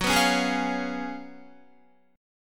F sharp Major 7th Suspended 2nd Suspended 4th, Guitar; F#M7sus2sus4 strummed